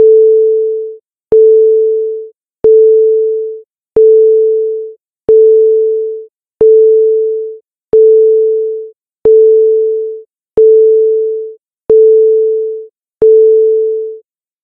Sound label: Error Message